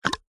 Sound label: cartoon suck tongue mouth designed; zapsplat